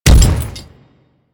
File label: Step Default